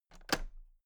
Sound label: toolbox latches open heavy